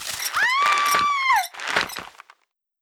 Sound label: Pull Rope; jackhammer 2